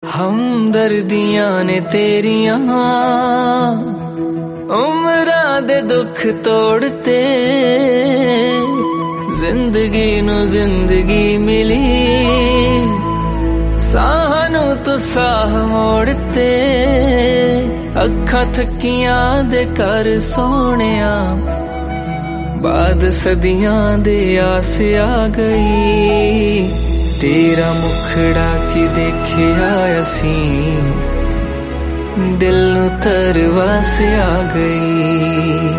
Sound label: Punjabi Song